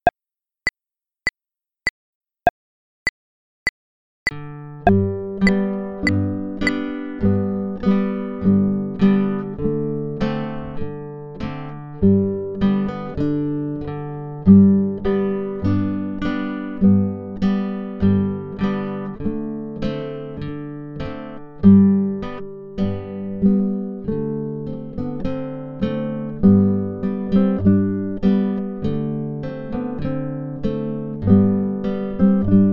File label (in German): playback mit click; butzemann